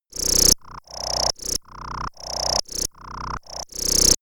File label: synthetic cricket; energie